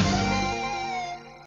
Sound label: guitar tone; BALLY; CORVETTE